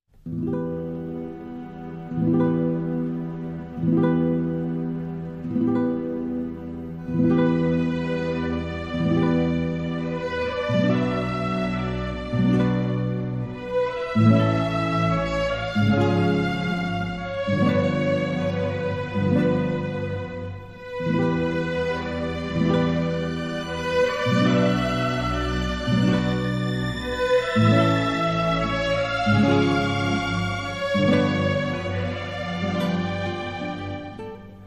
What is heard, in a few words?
ROMANCE FOR GUITAR AND O